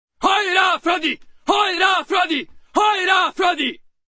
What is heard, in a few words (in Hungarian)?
hajra fradi